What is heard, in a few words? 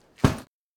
Body Fall